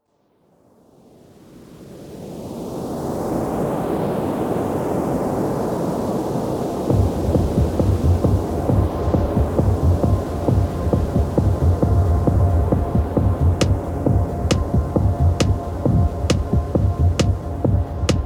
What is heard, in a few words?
Ocean of Noise